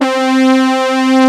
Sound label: SYNTH BRASS, ROLAND; USB Soundscan vol.49 - Analog Fantasies; AKAI